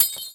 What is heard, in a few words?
tinkle smash glass